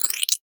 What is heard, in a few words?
Flash Animation Short